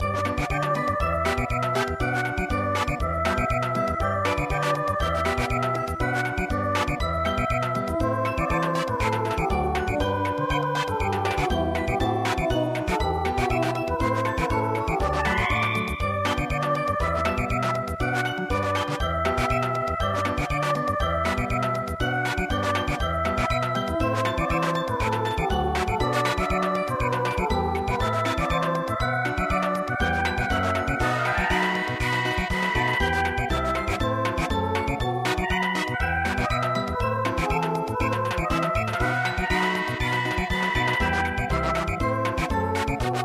March Samba; The Nutcracker

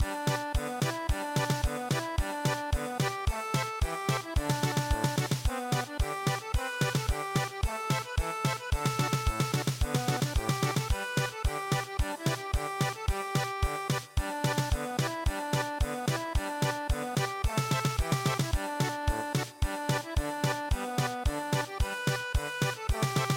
Square German Polka; midi; traditional

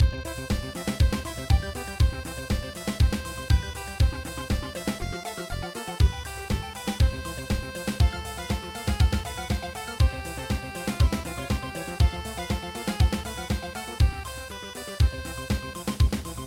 trance

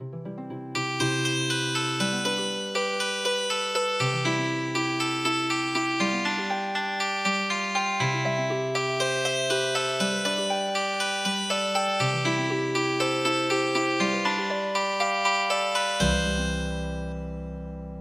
Healing Harp

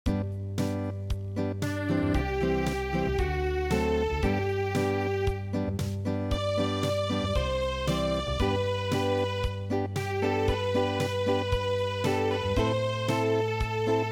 Unplugged, midi

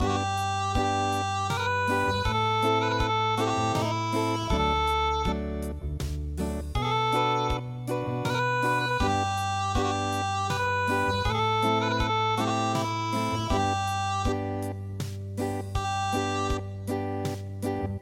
Unplugged

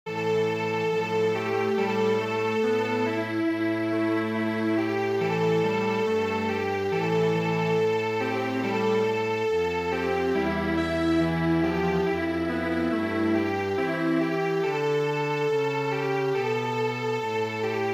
Strings Quartet

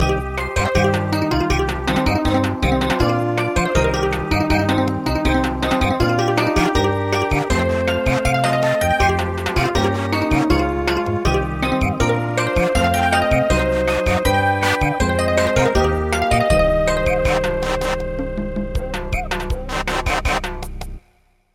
Samba; midi; traditional

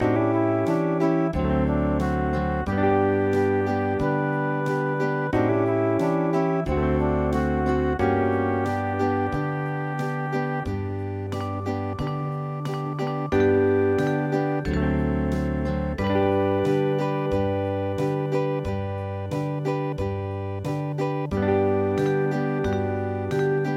Hawaian; ALIKA

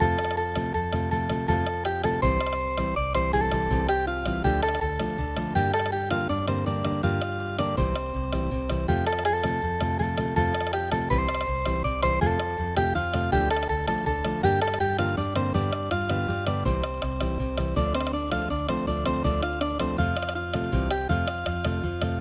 flamenco

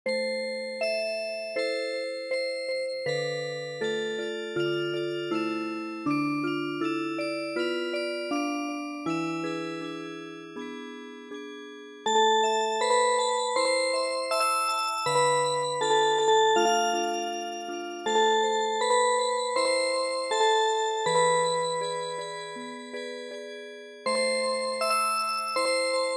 Music Box; full moon

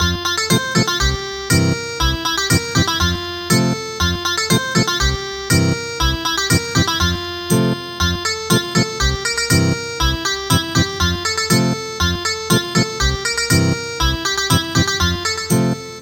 Samba Ethnic Guitar; midi; traditional

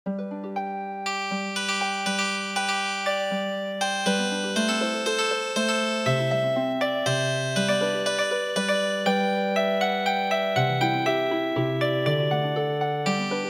Healing Harp, traditional; East India Nightingale

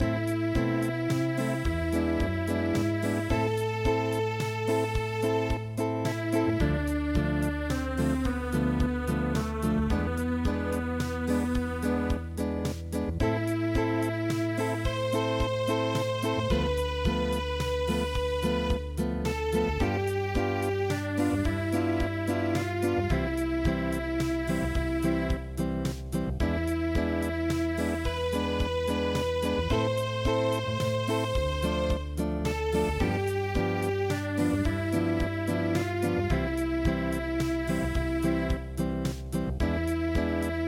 Unplugged, midi

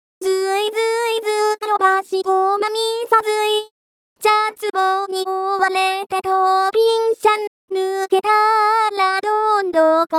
vocal only; traditional

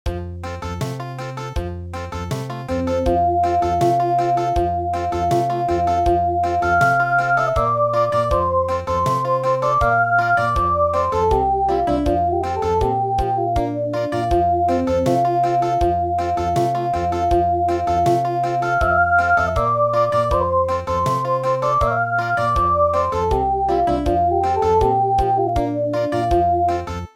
Country

